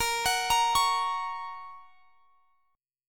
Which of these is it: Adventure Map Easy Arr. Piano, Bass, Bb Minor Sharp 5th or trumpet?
Bb Minor Sharp 5th